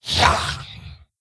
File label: Attack; General Vezax